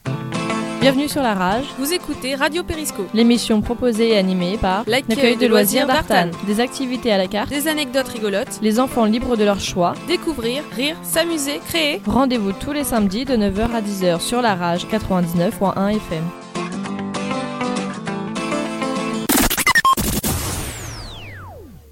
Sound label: JINGLE